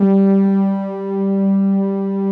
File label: PAD SOFTS; Pro-Rec - Synthsonic